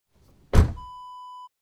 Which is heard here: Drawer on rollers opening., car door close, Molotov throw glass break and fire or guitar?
car door close